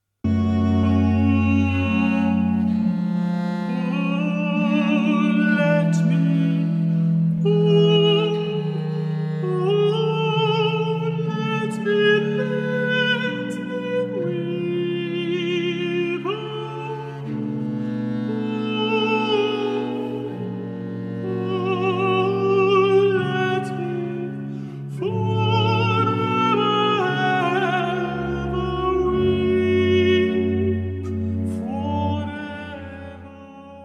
countertenor

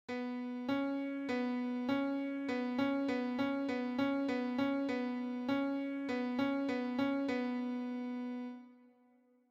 tone

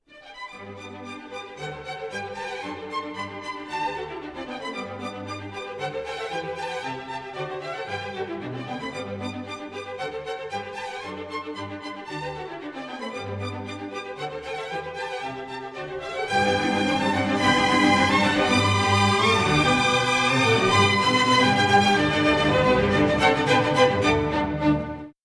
strings

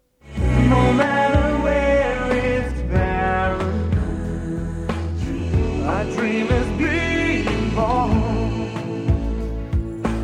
modulation

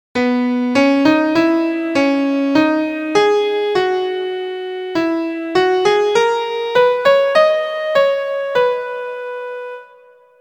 question and answer

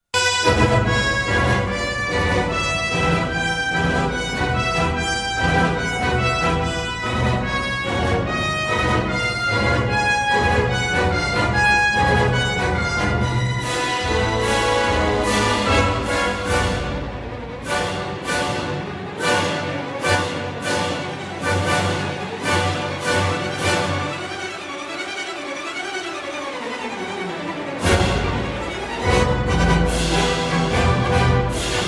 romantic

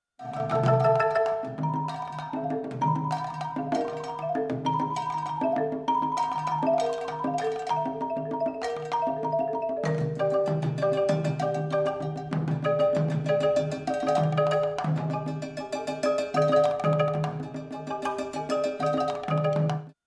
striking

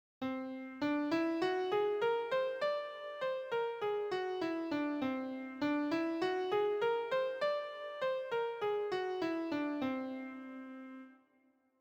whole tone scale

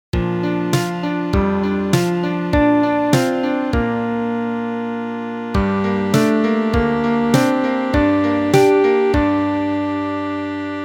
beats 4 pause

